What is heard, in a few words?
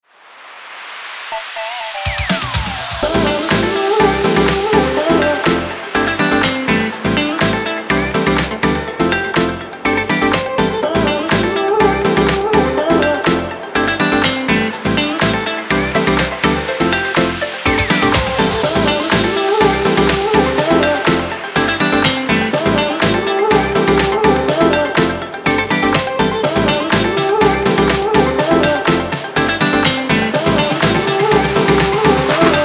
Radio Dub